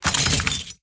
Mystery Box Shake

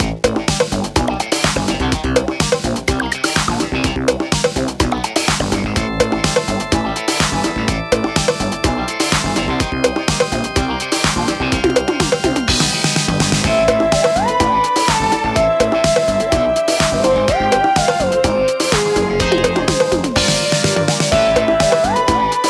Horror Thriller Loop; Deathwish Enforcers; PS4, PS5, Switch

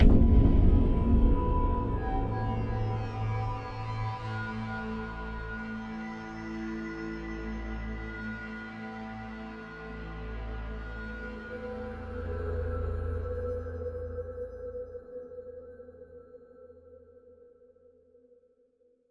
Stinger Tropics; Peak (2025)

